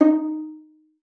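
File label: violin pizzicato D#4; Xsample VOL-5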